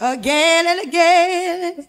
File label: again and again SUNG